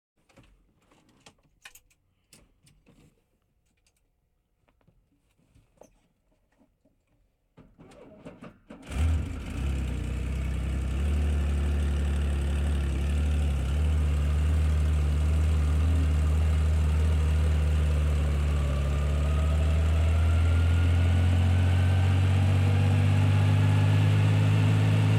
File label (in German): Luftwaffe Hubschrauberstart im Cockpit